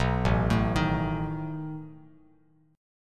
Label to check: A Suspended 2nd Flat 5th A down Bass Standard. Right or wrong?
Right